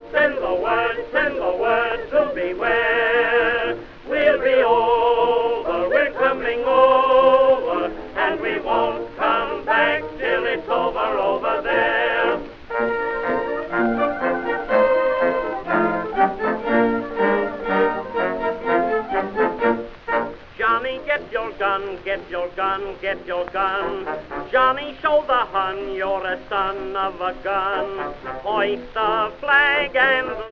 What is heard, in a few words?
baritone and chorus with orchestra